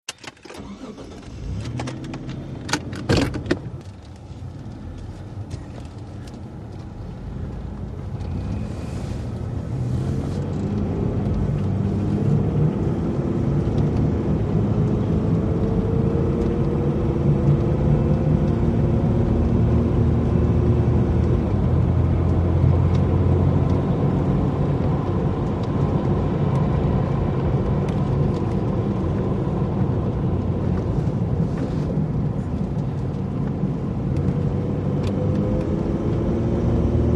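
Mitsubishi Montero interior: Onboard, start, constant run on country road, stop, switch off; VEHICLES MITSUBISHI MONTERO: INT: Onboard, start, constant run on country road, stop, switch off.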